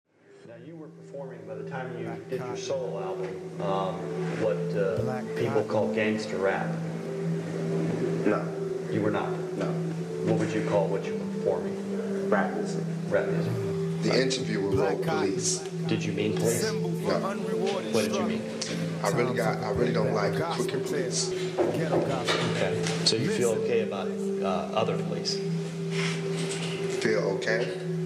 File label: remix extreme bass boosted